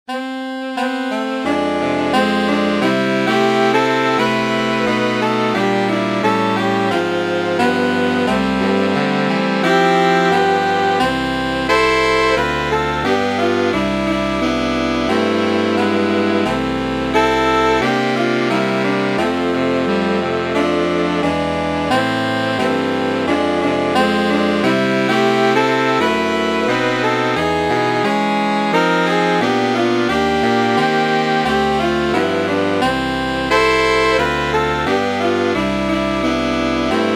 Saxophon Quartett S AATB

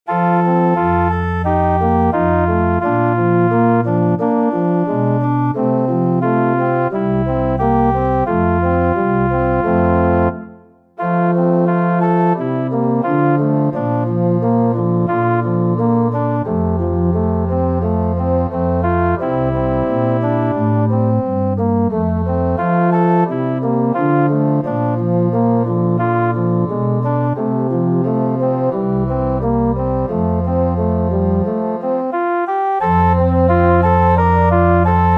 Fagott Solo + Orgel-Playback; Naeher mein Gott zu dir

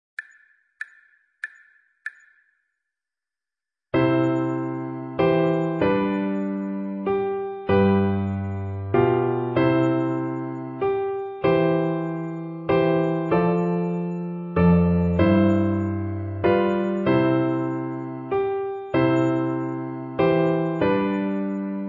Happy Birthday (Klavier einfach + Floete)

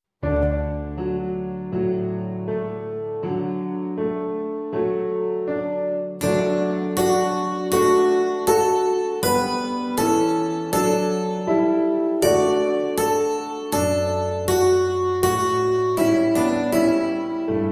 Wiegenlied

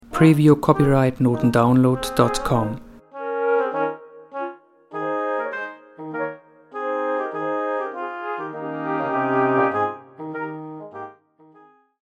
blaeserquintett

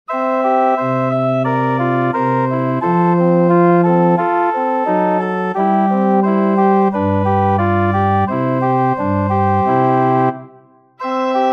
Oboe Solo + Orgel-Playback; Naeher mein Gott zu dir

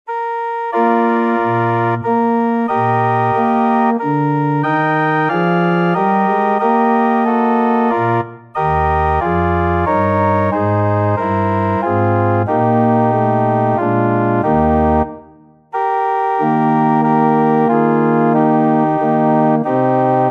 Ich bete an die Macht der Liebe (Querfloete Solo + Orgel-Playback)

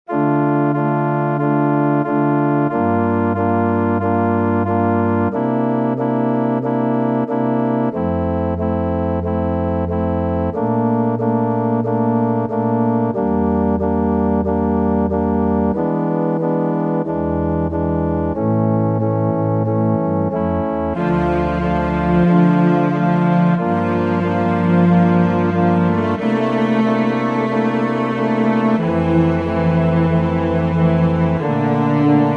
Violoncello Solo + Orgel; Ave Maria

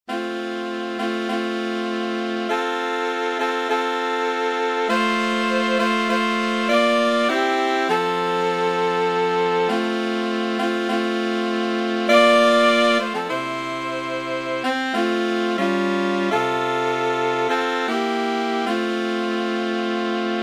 Saxophon Trio S AAT

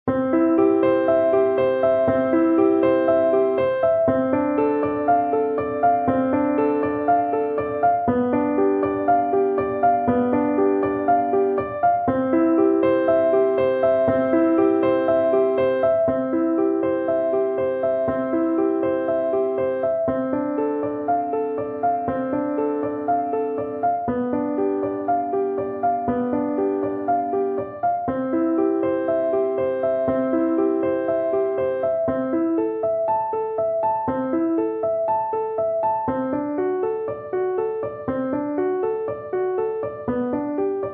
Ave Maria (Querfloete Solo + Klavier-Playback)

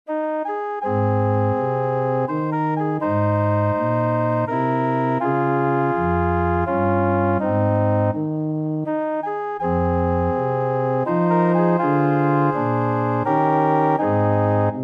Saxophon Solo + Orgel-Playback; Amazing Grace

